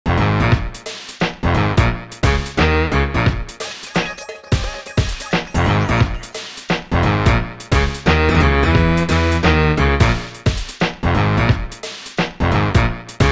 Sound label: sneaking